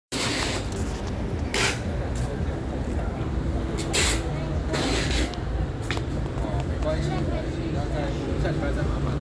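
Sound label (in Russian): звук упаковочной ленты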